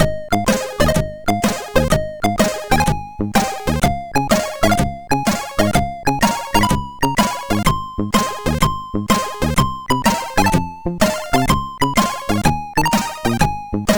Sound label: tune, MOS6581R4